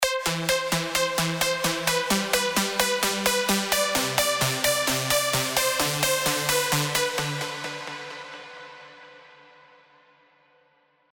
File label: Ecstatic Pulse, plucks; revealed spire ultimate plucks vol 1